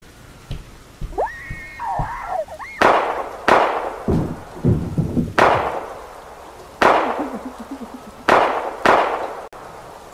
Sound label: scream shots